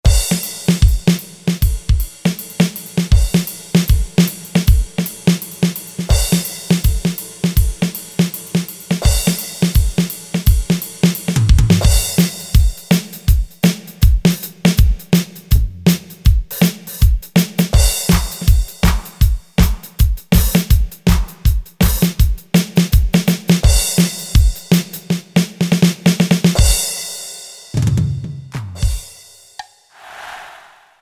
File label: STANDARD, Factory Rhythm Sets, Roland SC-880